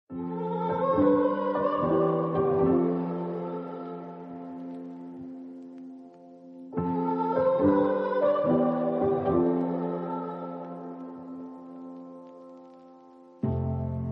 Instrumental

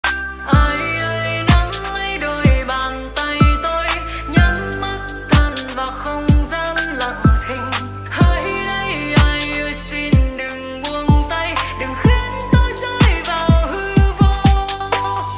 lofi version

